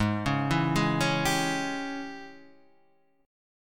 G sharp Major 7th Suspended 4th Sharp 5th; G#M7sus4#5 Chord {4 4 2 1 2 3} arpeggiated

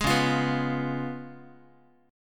C sharp 7th C sharp, Guitar; C#7; strummed; tuning=4A,4E,5A,5D,5G#,6C#; guitar chord

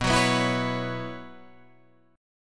B Suspended 2nd Suspended 4th, Guitar, Open G

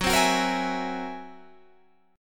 F sharp 9th Flat 5th F sharp, chord; F#9b5 strummed

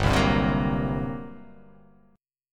G Minor Major 7th Double Flat 5th down, chord; strummed; JGuitar

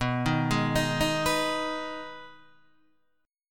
B 6th B down Guitar Open D

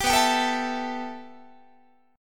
B 7th Suspended 4th Sharp 5th B down Guitar; B7sus4#5; strummed

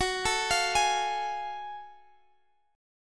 Gb Minor Major 9th Gb down Guitar Standard; arpeggiated; JGuitar

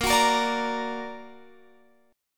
A sharp Augmented, Guitar Open F; A#+ strummed; x,17,18,17,17,17; guitar chord

